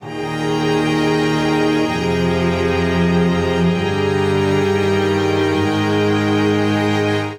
Progression Brisk Eb Major 130 bpm, string quartet; I-ii-iii-IV; MIDI Clips; Ableton; Factory Packs; Spitfire Audio